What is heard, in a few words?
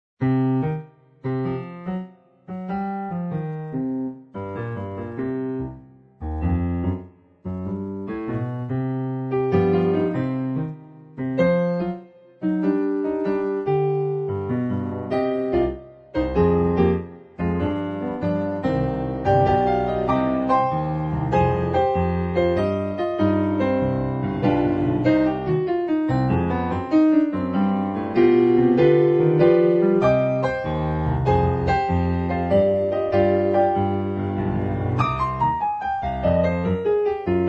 Jazz Invention